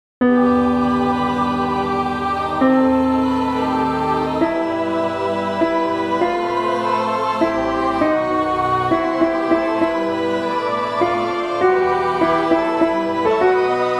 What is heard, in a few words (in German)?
Alt Klavier